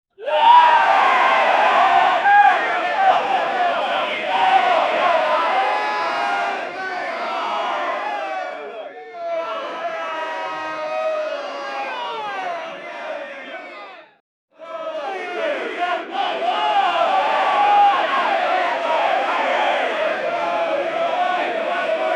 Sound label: small boxing crowd